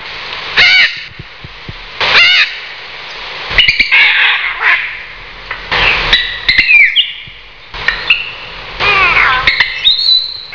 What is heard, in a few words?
Black Palm Cockatoo